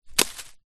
impact twig stick fall hit dried leaves forest floor; zapsplat